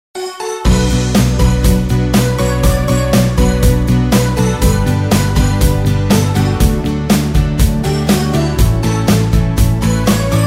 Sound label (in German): Honkytonk